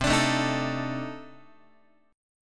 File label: C Minor 6th Add 9th C Guitar; Cm6add9 strummed; JGuitar